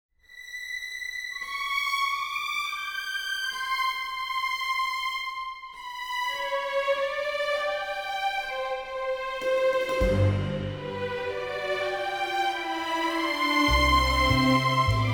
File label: G orchestral; key - G - vocal range - C to E; "the King and I"; gorgeous orchestral arrangement